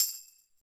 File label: normal slider tick; McOsu